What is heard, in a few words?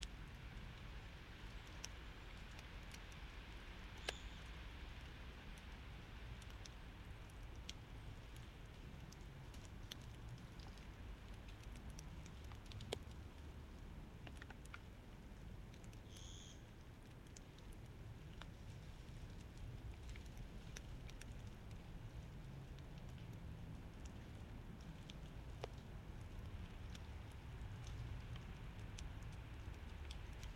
yamanakako sound